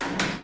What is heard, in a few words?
cell Door Close; Phasmophobia